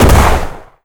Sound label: Explosion Small No Tail